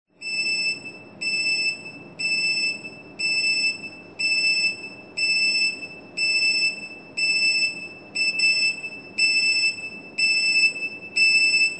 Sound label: PI PI PI PI Short Interval